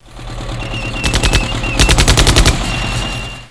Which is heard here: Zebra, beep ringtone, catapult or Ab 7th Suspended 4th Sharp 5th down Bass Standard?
catapult